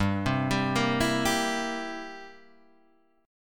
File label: G 6th Suspended 4th Sharp 9th, guitar chord; G6sus4#9 arpeggiated; JGuitar